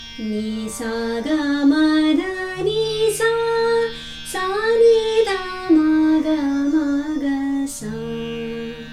Chandrakauns Aroh, raag hindustani

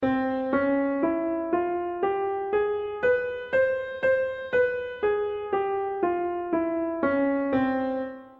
Bhairav Aroh Midi

